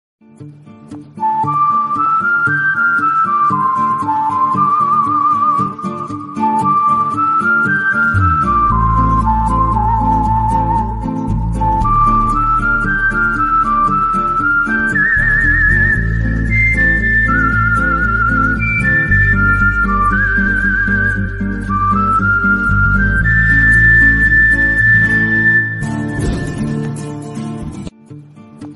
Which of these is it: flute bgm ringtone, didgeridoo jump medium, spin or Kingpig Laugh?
flute bgm ringtone